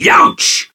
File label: pain; Awesomenauts; Commander Rocket